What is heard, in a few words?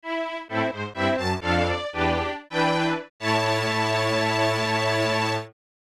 Unknown Jingle; Monopoly; Japanese 1993; SNES